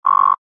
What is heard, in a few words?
store error; Original TK Sounds